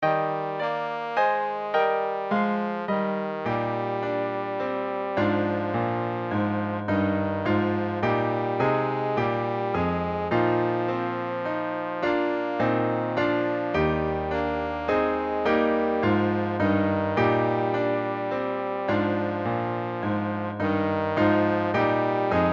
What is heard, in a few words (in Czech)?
pisen pozoun klavir; trombón + klavír; Píseň - V.Rebikov